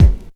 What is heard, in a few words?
clean bass drum B key, Royality free kick sound tuned to the B note. Loudest frequency: 146Hz